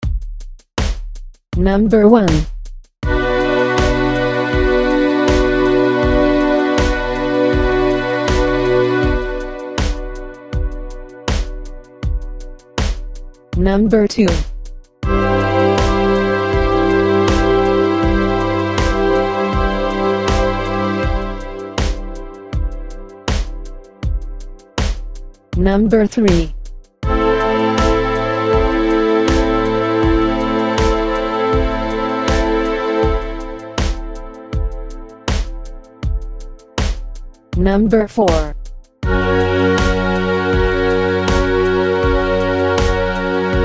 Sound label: chord quality id